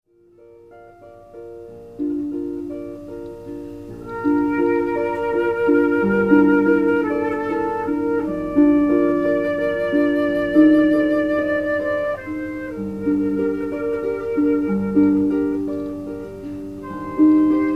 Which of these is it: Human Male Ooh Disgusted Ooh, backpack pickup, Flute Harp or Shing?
Flute Harp